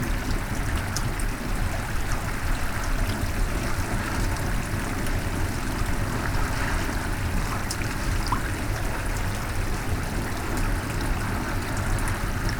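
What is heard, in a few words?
Water Bubble Water Boil; water sounds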